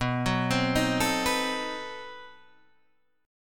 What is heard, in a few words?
B 7th Flat 9th B Guitar Open D